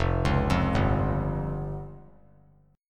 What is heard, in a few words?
F Suspended 2nd Sharp 5th F down; Fsus2#5 arpeggiated; JGuitar